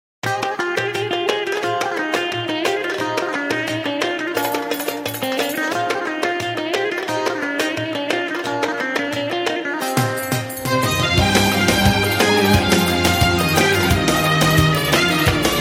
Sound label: Ringtone Mobile